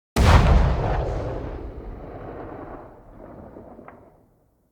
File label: Siege Engine Cannon Tail; Weapons; Enemy; Artillery; Ode Siege Engine